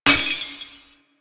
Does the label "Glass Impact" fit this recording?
Yes